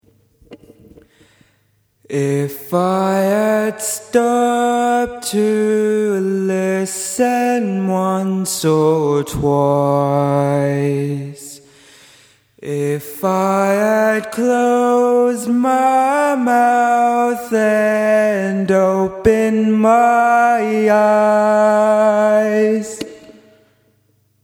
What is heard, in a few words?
solo line